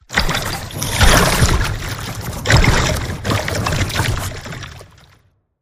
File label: Mermaid Ocean Splashes